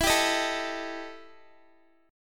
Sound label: E Minor Major 7th Double Flat 5th, Guitar; strummed; JGuitar